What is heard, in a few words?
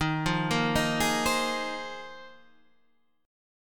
Bb 7th Suspended 2nd Suspended 4th Eb